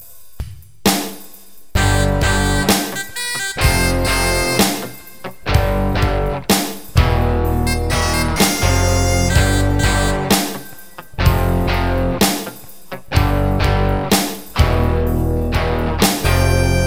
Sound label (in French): cornemuse